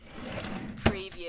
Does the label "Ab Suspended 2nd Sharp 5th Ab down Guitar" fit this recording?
No